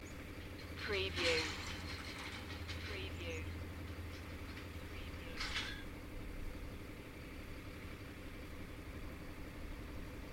Vehicle engine tickover and clatter in a rural area; beanstalk audio